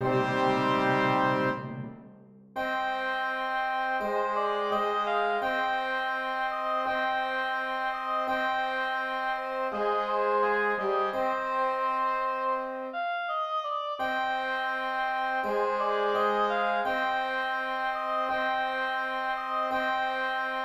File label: Swan Lake Oboe